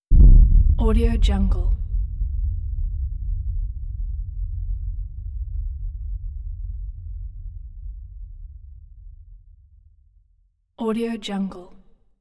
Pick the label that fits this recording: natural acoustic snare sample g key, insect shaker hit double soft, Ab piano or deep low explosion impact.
deep low explosion impact